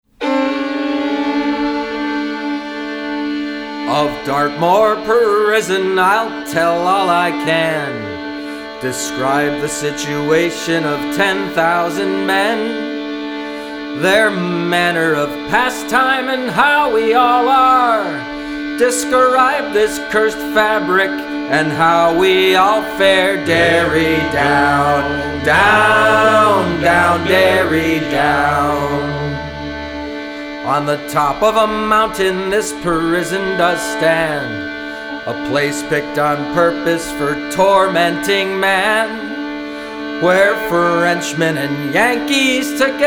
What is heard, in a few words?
prison song; 1812; dartmoor